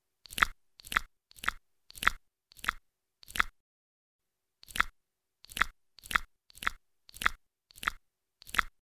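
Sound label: Licking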